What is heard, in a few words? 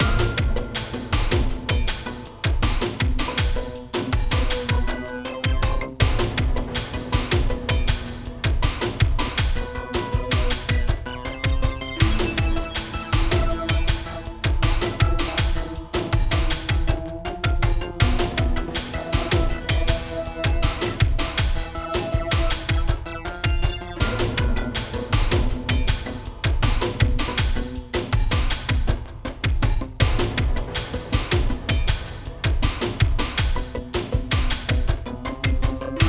Music; Sifu